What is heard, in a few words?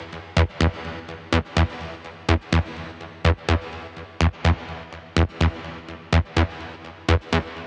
Synth Loop E 125bpm